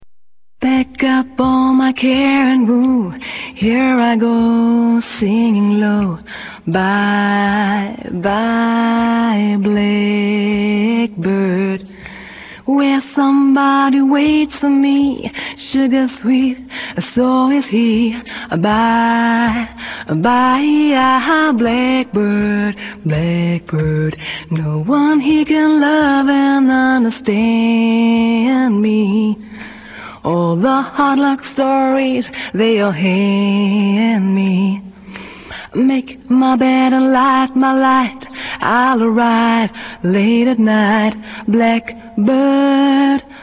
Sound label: vocals